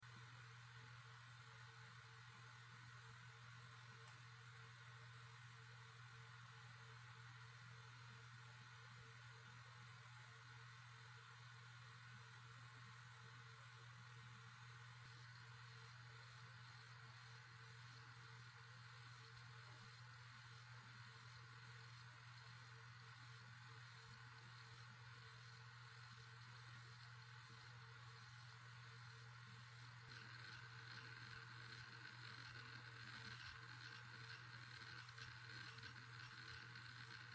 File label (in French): hitachi 7k1000 1to